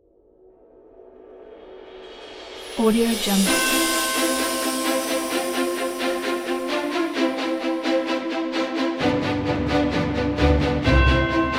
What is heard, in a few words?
christmas epic adventure